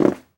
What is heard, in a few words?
carpet land; Golden Crayon Sounds